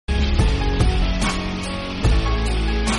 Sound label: notification tone; roman reigns